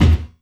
bass drum, drum & bass

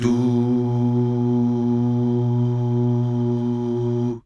DUUH

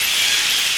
Crowd Cheering; SNES; ABC Monday Night Football

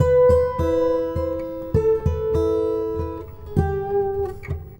Red Trees Gtr, Guitar Only; Sonic Foundry (Sony Creative Software); Friendly Fire

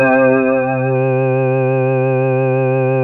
Bell Syn C4, Fairlight CMI IIe

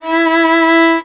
VIOL E3, VIOLON, Arabian Traditions; USB Soundscan vol.43; AKAI

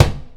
MEDICINE CAB, PERCUSSIVE

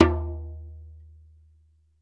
Ritual Drums, Drums Percussion; E-MU Producer Series Vol. 7 – Old World Instruments

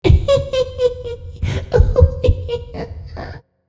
LAUGH, HipHop Vox

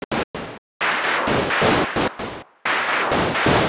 Gated Seq Noise, Gate Filtered 130BPM; noise hiss crackle

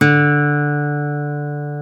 GTR Nylon, Nylon Martin

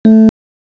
ELEK KEYB; CMI Sound Library Rev 1.3

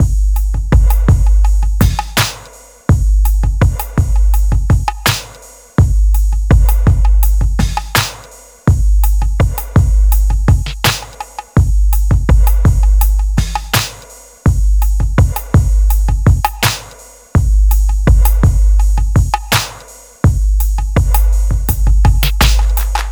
drums AC, Smooth RnB, Fmin

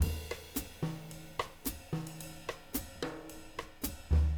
110 LATIN, Living Drums; Sampleheads; Peter Erskine; JZ 110 LATIN

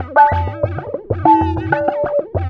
TABLAS; Zero-G; Chemical Beats

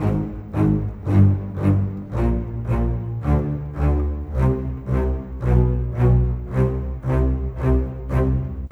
Rock Pop Bass Cello, Orchestral; Sonic Foundry (Sony Creative Software); Orchestral Series VOL-4 - Rock and Pop

